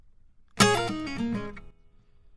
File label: 101 Pop Ac-Guitar Dbmaj; Pop Rock; Acoustic Guitar; Pro Tools Essential Loops; Digidesign